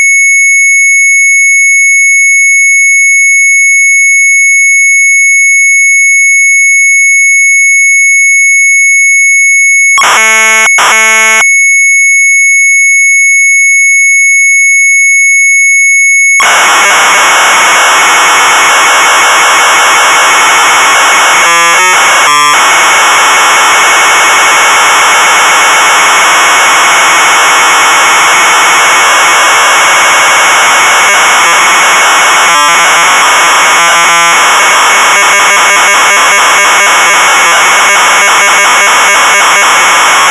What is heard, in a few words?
MZ800 Tape, sharp; Making magic music; retromelsarchive